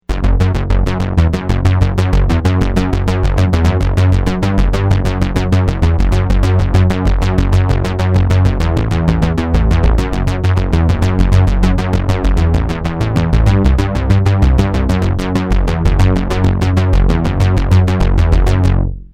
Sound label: bass